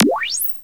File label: Korg Z1